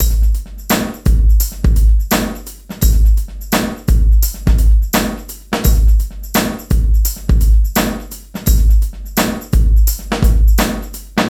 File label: DRY LOOP 85